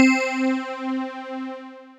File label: Bell, Mallets; Instruments; PS Bell; Ableton; Synth Essentials; Operator